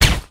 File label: silenced; weapons